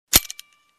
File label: Construction